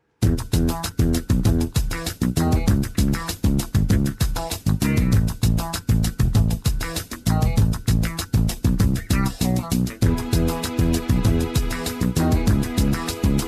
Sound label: Guide Vocal Track Verse